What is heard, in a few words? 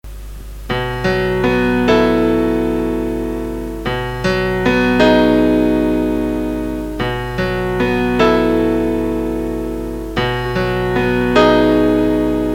short piece for piano